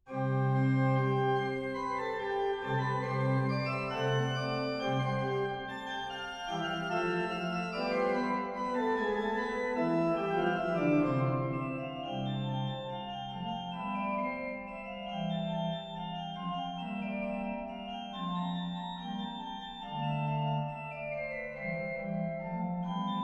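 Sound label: rondo praeludium c, organist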